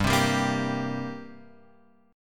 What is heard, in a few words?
G Minor Double Flat 5th, G; Gmbb5 Chord {3 3 5 3 X 3} strummed